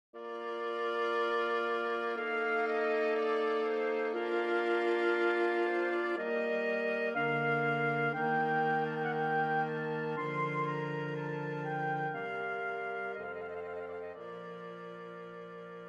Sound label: String Quartet in D minor; String Quartet 35; III. Adagio e cantabile